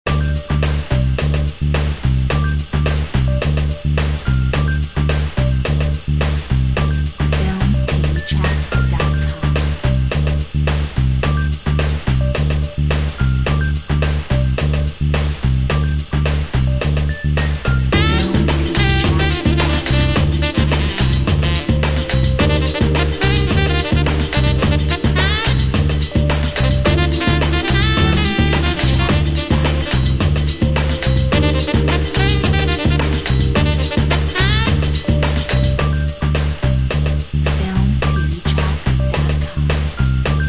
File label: Brazilia jazz